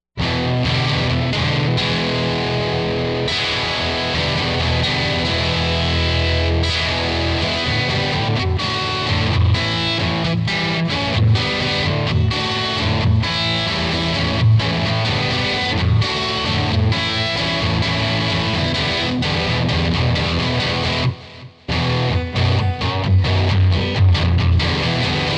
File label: electric; MegaEpix